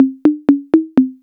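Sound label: TR-808 mid conga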